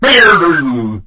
death sound; ig88; lego star wars

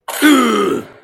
thwomp sound

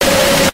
jumpscare sound

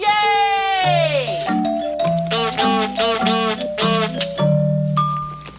yay; uhf

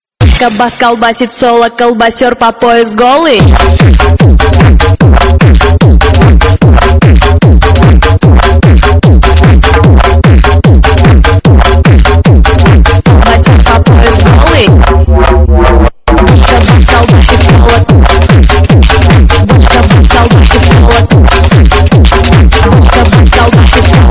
bochka bass loud

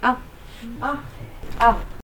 ah ah ah

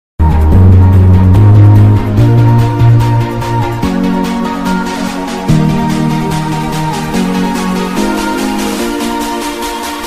outro song bass boosted

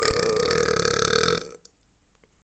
long juicy burp

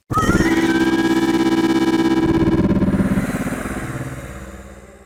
crablet death

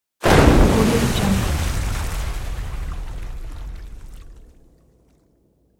water explosion; evdokim-music

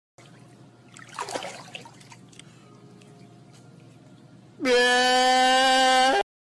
guy cries after going underwater

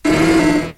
quagsire cry

